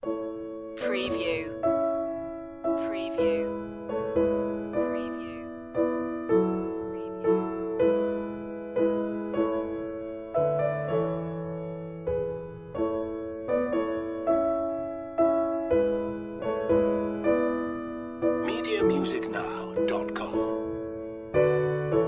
piano sonata no11 a major kv331 1st movement theme; wolfgang amadeus mozart; classical music for media